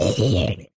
death, mob zombie; ayuncraft-1.5

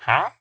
haggle, villager; minecraft; mob

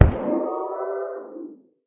guardian death; minecraft; mob

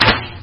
bow

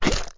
slime attack, mob; Eaglercraft; eaglercraft-b1.3

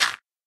gravel, blocks

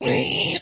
zombie pig hurt; glercraft b1.3; mob

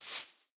grass, step; minecraft

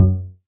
bass attack, note; minecraft; eaglercraft

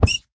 hurt, rabbit; minecraft; mob

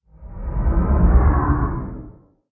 elder idle, guardian; minecraft; mob

